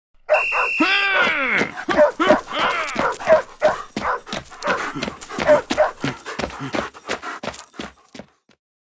Start Run; subway surfers city sound effects; Android, iOS

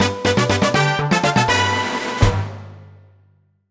Item Minigame Win!; Mario Party Superstars; Mario Party 3

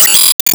Flick

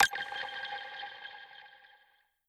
Count Down

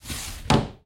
Shoji Close

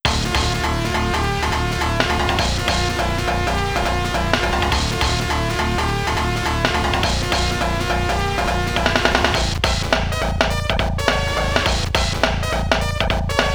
FM Synth

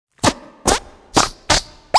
Sfx Move

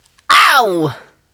enemy die; Wild West Shootout (Arcade) (gamerip) (2019)

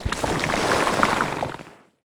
Zuruzuru

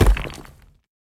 Break Stone

